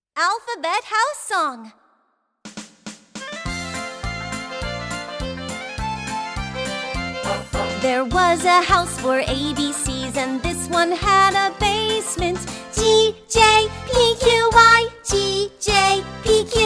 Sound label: Alphabet House Song